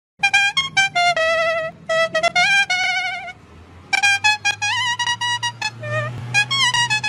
squeaky music meme

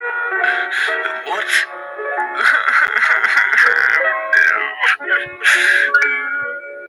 crying; prince charles

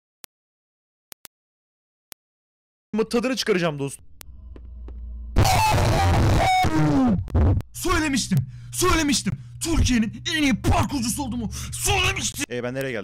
scream; baldiback

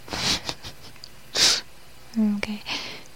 whisper giggle

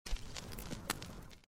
fire hit shield

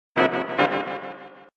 battle start jingle slowed

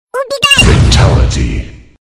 slam

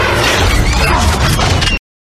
jet transformation

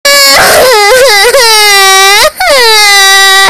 crying

